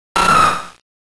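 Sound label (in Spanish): "Grito de Aron", Pokémon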